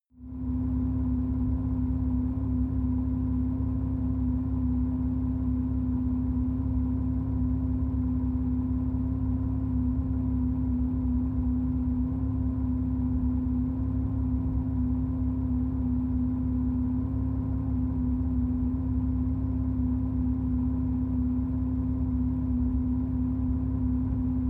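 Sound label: sports car interior engine idle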